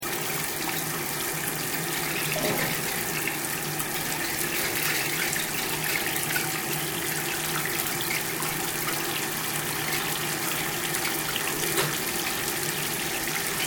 SHOWER SYSTEM JAPANESE WATER RUNNING LOOP, Japanese bathroom appliance; Bathroom Sound Effects; Household